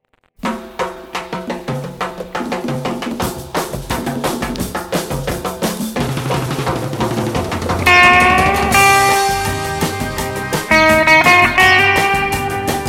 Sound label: guitar; レスポール型モズライト